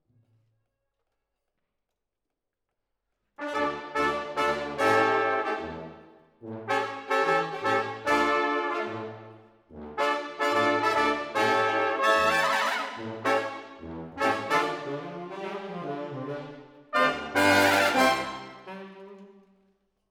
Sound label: Horns Over